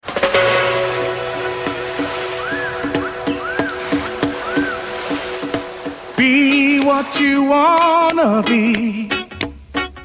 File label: commercial song ringtone